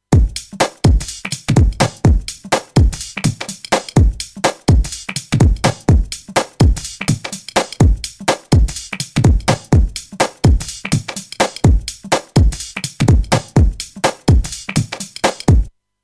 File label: WES Audio Mimas Beat Original